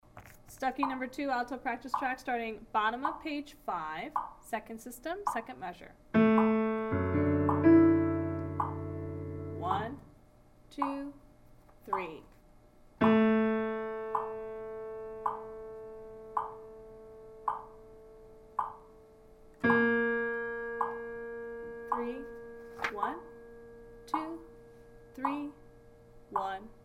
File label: Alto